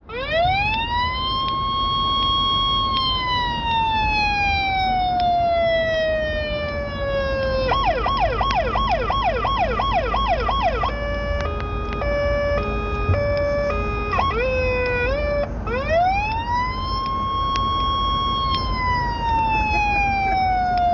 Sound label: alerter ringtone